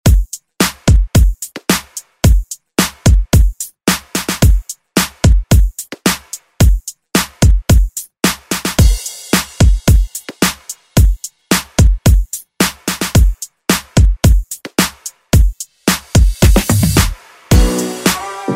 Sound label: Hip Hop Redrum; Deejay Hit